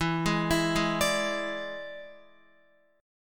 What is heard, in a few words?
E 7th E down Guitar; E7 arpeggiated; JGuitar